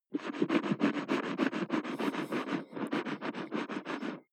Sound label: mouth rinse water